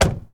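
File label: Truck Cherokee Door Close; SFX Kit; Trucks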